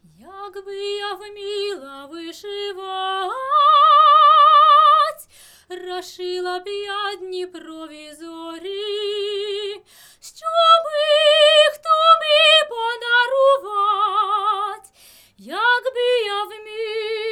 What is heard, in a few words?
vokal narodn